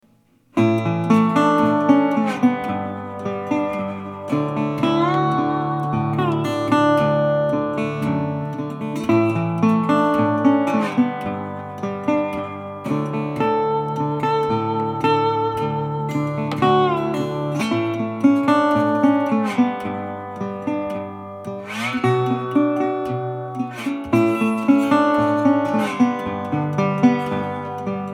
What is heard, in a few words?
Duo Brass